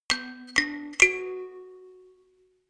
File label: notification kalimba rattle triad up minor low